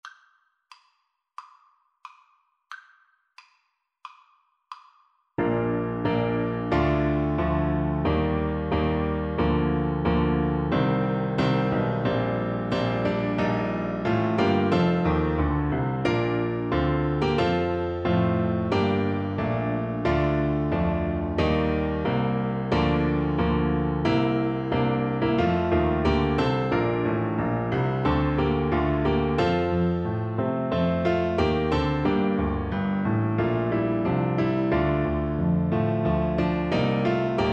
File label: national anthem russia, Trombone